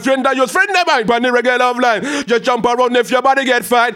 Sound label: RAGGA MUFF, B maj; Sound & Vision - Gigapack II